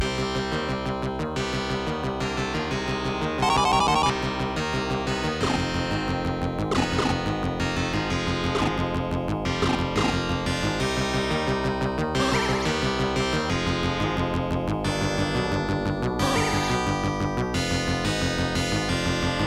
cave bgm; 3 . 4 stages; Capcom Game Music Volume 1

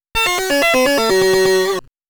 GAME OVER, commodore 64; Arkanoid; Story of Bubble Bobble Vol. III

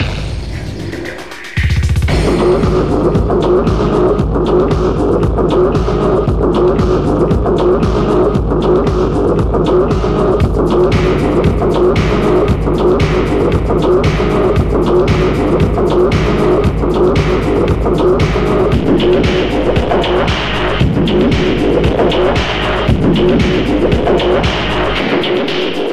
Tech noise; Asphalt Urban GT 2; psp

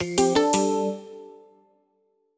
Jingle Score; clubhouse games 51 worldwide classics 2020 switch gamerip

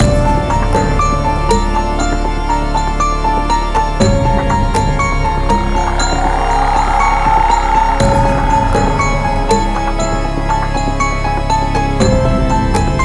MUSIC Horror; thrillville off the rails

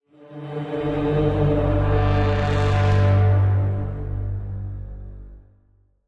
Stinger; Angry Birds Star Wars 2; Crimson Stinger Dark

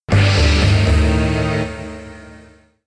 Danger Jingle; Dillon's Rolling Western; Nintendo 3DS

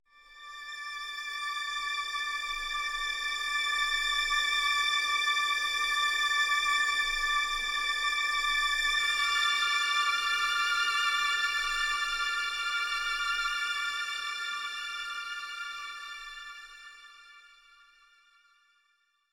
Jungle Strings; Far Cry

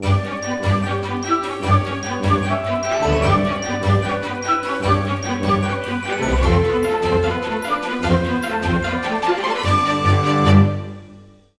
sting new game start; The Sims 3; Windows

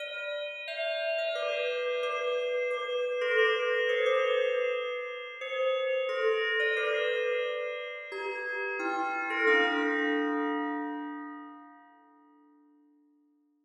Tubular Bells; Five Nights at Wario's 2

